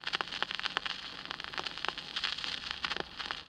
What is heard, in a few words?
Vintage Turntable, Dirty broken needle on a vinyl record; Sound Ex Machina